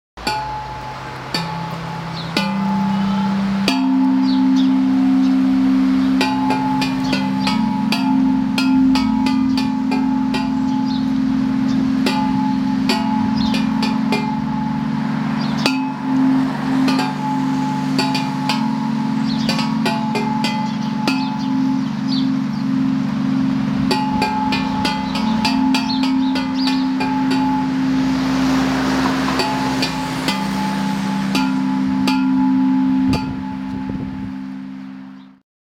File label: Emperor Chimes